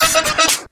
Horns Sample

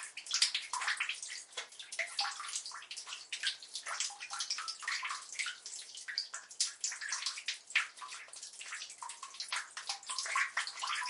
Water Trickle; lethal company

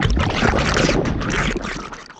Root Acidfloor

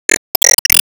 Emotion Down

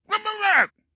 Guard Start Run Stumble Come Back; Subway Surfers City Sound Effects; Android

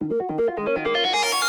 Fill Tech Filterarp 160 D#

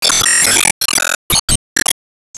Enemy Jet Active Hit

